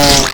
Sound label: buzzer stab; cstrike